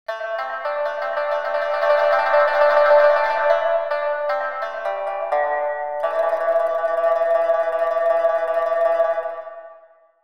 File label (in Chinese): 琵琶独奏